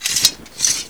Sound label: Inf Weapons Foley 3rd; Red Orchestra 41-45